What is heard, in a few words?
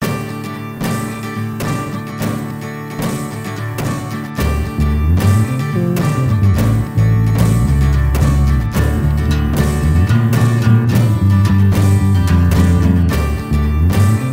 Catchy Instrumental